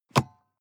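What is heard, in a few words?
sports car interior compartment close medium; verkehr transport; autos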